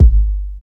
hip hop bass drum sample g key, Royality free bass drum single hit tuned to the G note